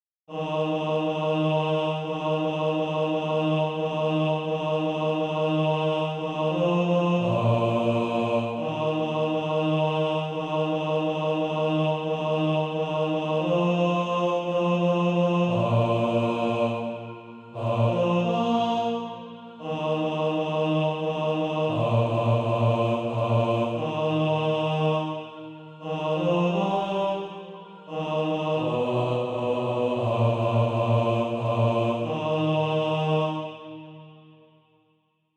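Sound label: bass, Sheet music (SATB) including Practise Music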